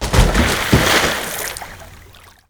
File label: hit well; Ringling Bros. and Barnum & Bailey; Wii